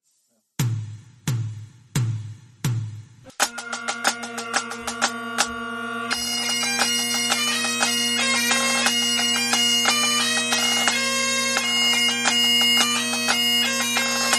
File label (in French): Instrumentale Thème 3; Triomphe des Sonneurs; Kas Abarh; Sonerion